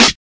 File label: 00s subtle reverb snare drum g sharp key